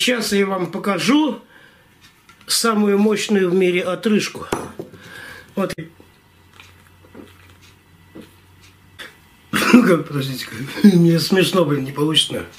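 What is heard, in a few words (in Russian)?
самая мощная в мире отрыжка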